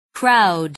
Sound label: crowd